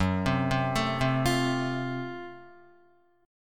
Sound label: Gb Minor Major 7th Flat 5th, Guitar; arpeggiated; 4F,4A,5C,5G,5C,6E; 1,3,0,2,0,1